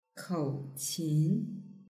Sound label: kouqin; yueqi